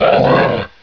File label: gr2 pain; TNPDEMO